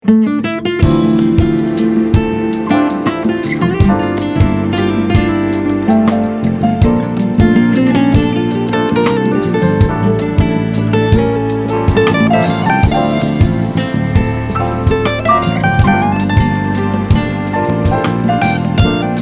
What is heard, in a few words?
guitar solo